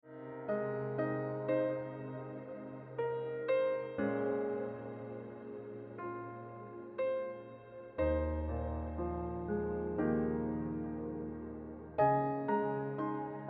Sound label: Ringtone Piano Music